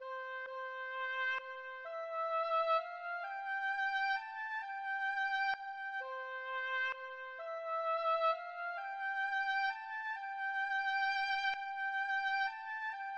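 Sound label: In Dulci Jubilo C English Horn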